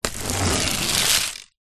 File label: stick drag scrape concrete ground; foley